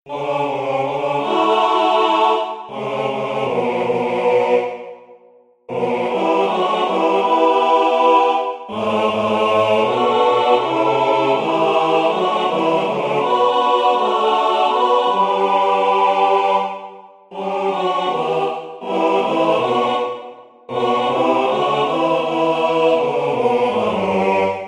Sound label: MIDI SATB